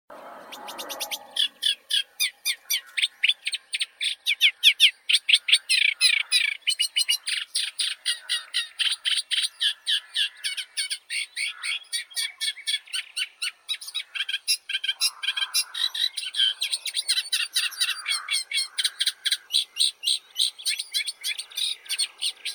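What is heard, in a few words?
reed warbler, acrocephalus scirpaceus; kamishovka trostnikovaya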